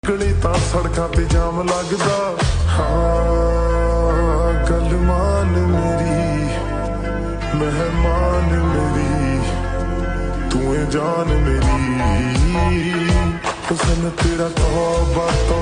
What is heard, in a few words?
slowed